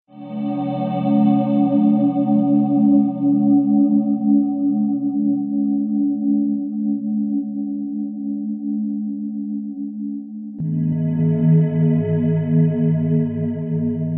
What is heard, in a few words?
Most Horror Tune